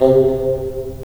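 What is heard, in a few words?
wind bassoon; LA Composer vol. 3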